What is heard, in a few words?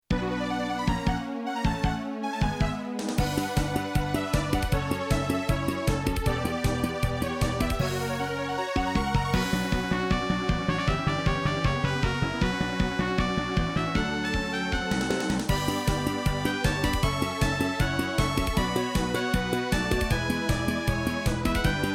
battle korg